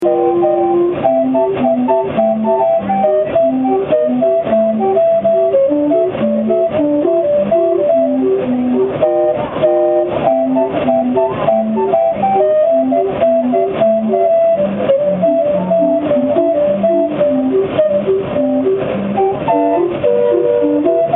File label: calliope; spirit of peoria